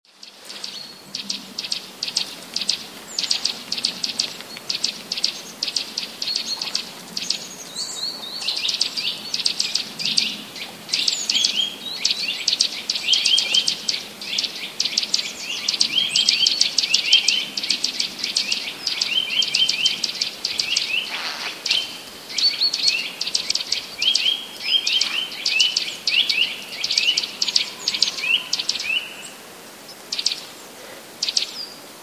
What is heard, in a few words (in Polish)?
kowaliki, 3 kowaliki, z których 2 szczebioczą (każdy inaczej), a 1 podśpiewuje